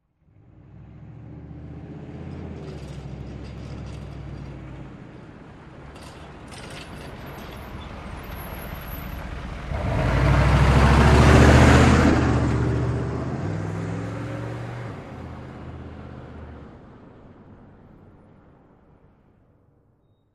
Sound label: Humvee: Medium In, Stop And Away.